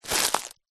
office document folder wallet plastic grab pick up